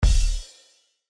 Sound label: Cymbal